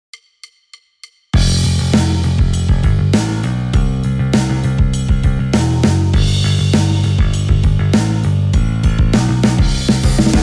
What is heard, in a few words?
bass highpass